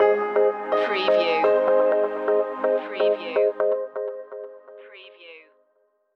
cloud environment stinger

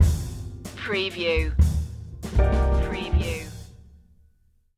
jazz fusion stinger

